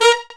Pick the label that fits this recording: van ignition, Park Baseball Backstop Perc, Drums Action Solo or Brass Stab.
Brass Stab